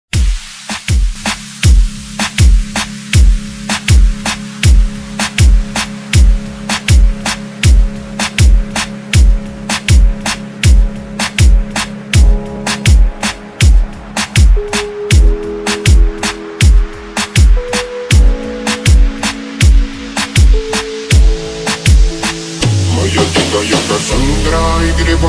lofi song slowed reverb

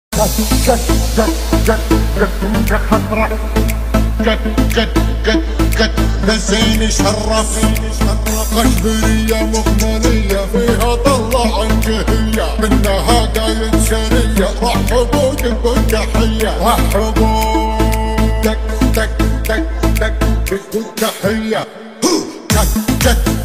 arabic remix car music bass boosted

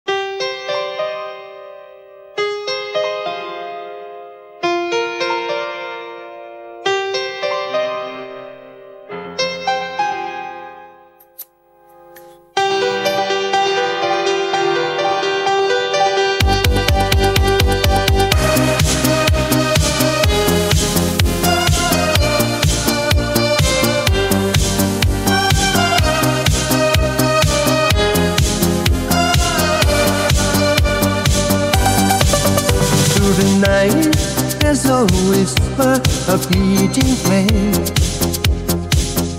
80s disco

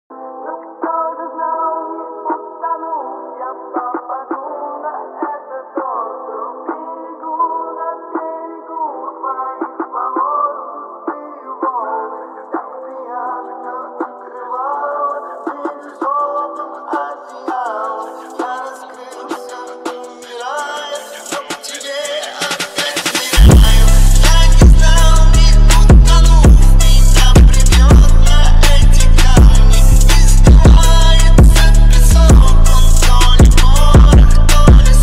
phonk

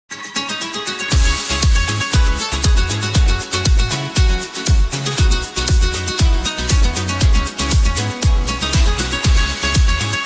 spanish guitar italo disco

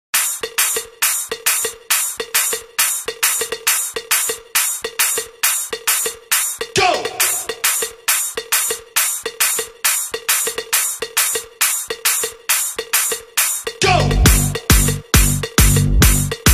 trance mix

